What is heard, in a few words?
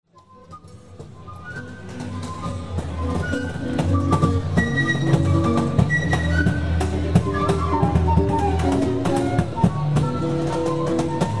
jazz band